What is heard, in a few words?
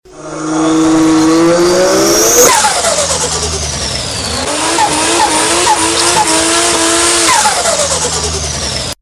turbo; ringtones